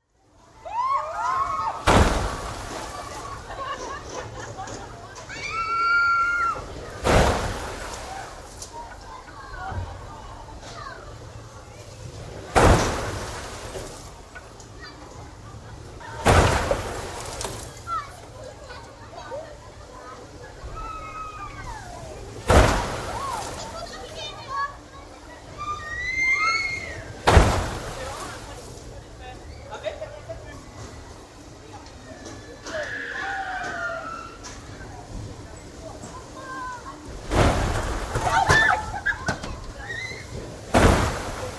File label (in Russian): zvuki akvaparka